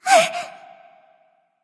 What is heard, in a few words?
grunt hurt; smite; scylla